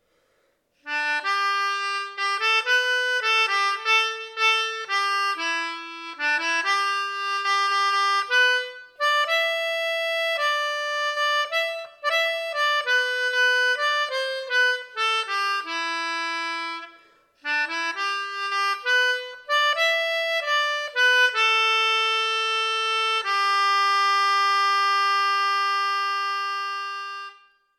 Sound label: folk melodica; stagg